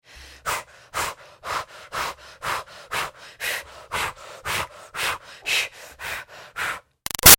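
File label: Human Female Breaths Exercise Heavy Fast; Breathing; Big Room Sound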